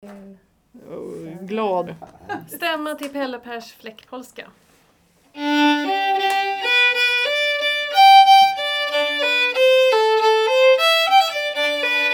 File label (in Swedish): Stämma polska; Pelle pers fläckpolska